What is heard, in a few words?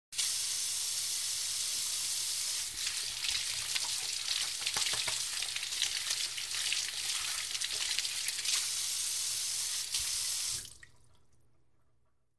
Locker Room Faucet, sink